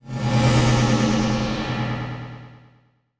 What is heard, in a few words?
Transition Metallic Warble; Production Element